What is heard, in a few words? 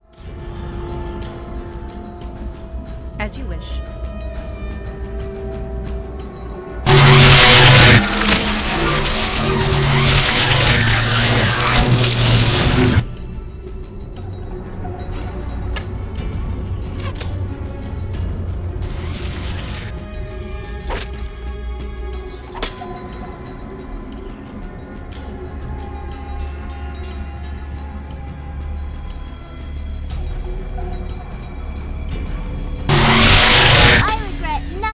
spike FX err loud